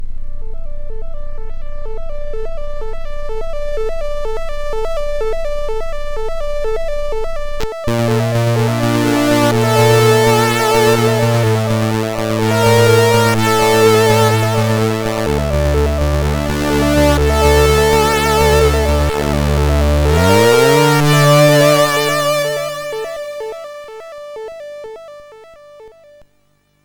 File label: Intro, sid, MOS6581R4